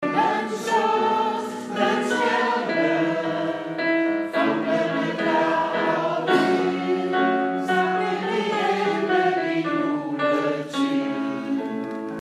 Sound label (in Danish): alt tenor